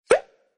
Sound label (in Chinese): 划过